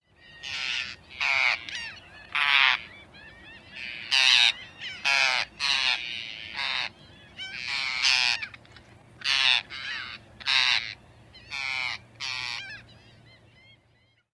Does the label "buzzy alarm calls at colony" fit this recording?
Yes